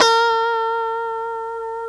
B FUNK POP, STRAT

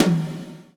GATE TOM, Drums Acoustic

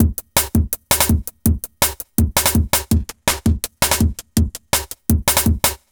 165 BK, Explosive Jungle; 165 BREAK; USB Soundscan vol.05; AKAI; 90s sample CD

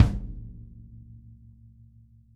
kick; Sonic Reality

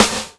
GATE SN, GATE SNARE; AKAI S6000 CD-ROM - Volume 3

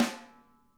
SNARE; Sonic Reality; Interactive Drum Kits

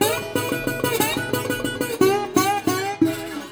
Blues Guitar, LICK; ILIO; Fretworks; SIMMS

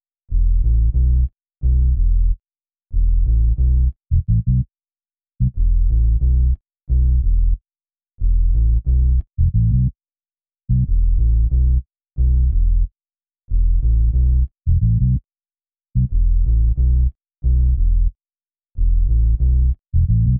Bass; Sonic Foundry; Westside Underground; Rain Musik

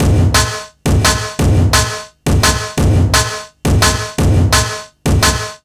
172 break, Jungle; USB Soundscan vol.05; Explosive Jungle; AKAI; 90s sample CD

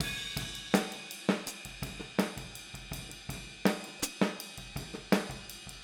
Boogaloo Chorus Hi-Hat, 82 BPM; Drums from the Big Room The Mixes

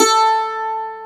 Mandolin A3; Multisamples; Digital Sound Factory Planet Earth

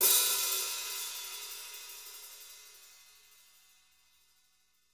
hat, drum; 90s sample CD

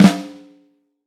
FLAM, Snare Drum; Vintage Snare Drum; 12X15; Q Up Arts; Johnny.C's Vintage Snare Drum

